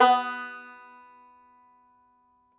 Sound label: Tenor Banjo, Plucked Strings